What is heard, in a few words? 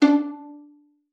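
violin pizzicato D4; Xsample VOL-5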